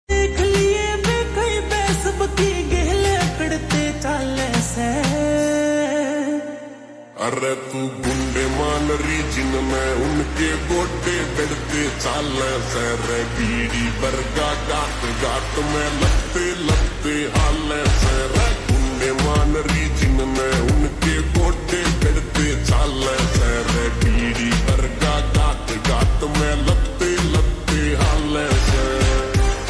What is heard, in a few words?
Slow Reverb Version Ringtone